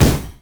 displacement flash; LoL; leblanc

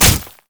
spider basic attack; Elise

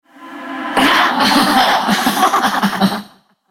spider laugh; Elise

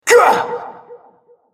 effort; RumbleSkin03

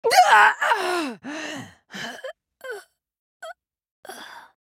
dying; Shyvana

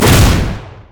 fracture; LoL; skarner

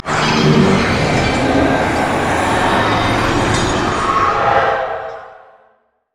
wall; LoL; thresh

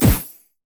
basic attack; LoL; soraka

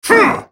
effort; Brand

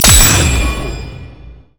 pick a card gold card; LoL; twistedfate

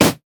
red mech melee basic attack; minions; LoL

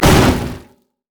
minotaur pulverize; LoL; alistar

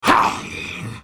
spell cast effort; Renekton

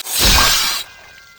dash strike; LoL; yasuo base

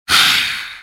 spell cast effort, en US; Elise

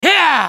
spellcast effort; BlindMonk

